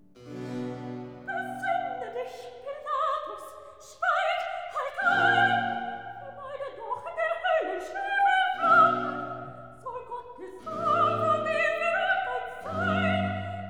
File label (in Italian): Recitativo; Besinne dich, Pilatus; Brockes Passion